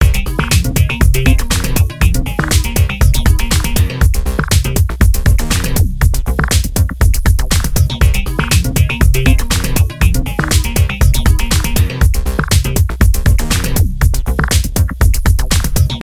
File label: free spin melody; Cool Diamonds 2